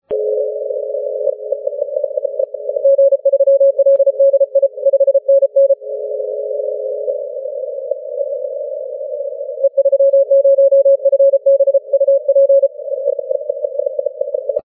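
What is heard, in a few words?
15m continuous wave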